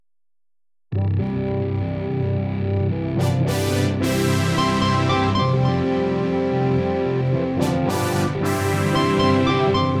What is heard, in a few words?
brass